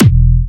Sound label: Fatterr - E4, Kicks, Big Room Kicks